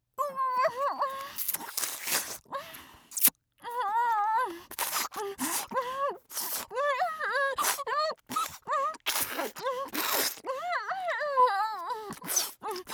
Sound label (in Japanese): BGV(フェラ)